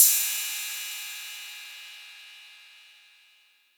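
cymbal sample D key, Royality free cymbal sound clip tuned to the D note. Loudest frequency: 8888Hz; Tuned drums (D key)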